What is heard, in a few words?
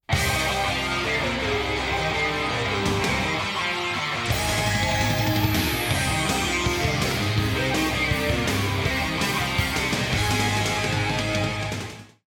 start action; music kits custom